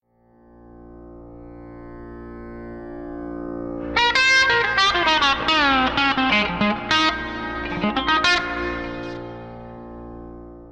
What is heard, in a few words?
Minor Down drone, pedal steel